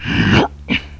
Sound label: jump; openffa